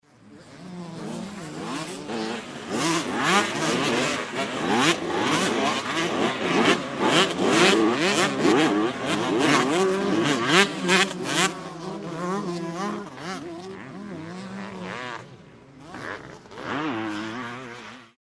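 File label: motocross engines roar revving race start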